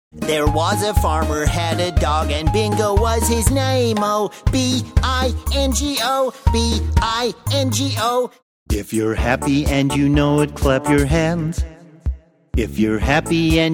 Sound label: US SONGS